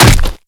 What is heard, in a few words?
Infested Ancient Step Default